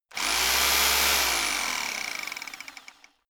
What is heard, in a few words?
rock drill chisel burst short; maschinen; schrauben drehen hydraulik